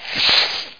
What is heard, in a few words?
whooshy; World of Video